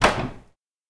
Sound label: Door Open; RPG Creation Kit; RPG Sounds Pack 6; qubodup